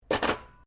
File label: cutlery general place drawer, Household cutlery; Kitchen